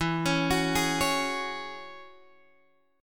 E 6th Add 9th E down Guitar Open G

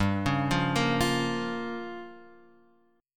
G Minor 7th Flat 5th

